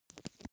makeup cotton stick clean; haus-garten; badezimmer

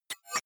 hover fast, signale; soundpool

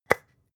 spice jar scratch tonal close short; industrie wirtschaft; gastronomie

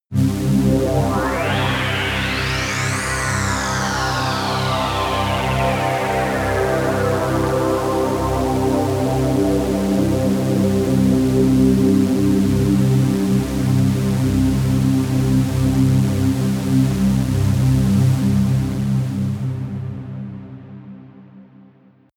pad sweep brass; flaechen

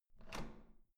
door handle up

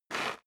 step sneaker concrete grit slide short; schritte

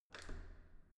entrance door handle down normal

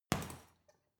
boxing punching bag hit medium; sport-freizeit; boxen

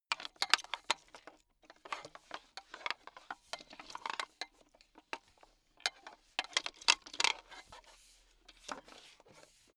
metal pipe handling low

